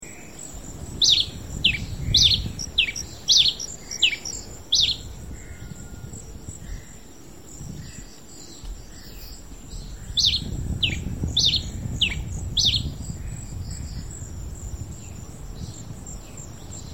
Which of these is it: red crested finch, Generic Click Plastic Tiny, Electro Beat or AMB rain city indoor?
red crested finch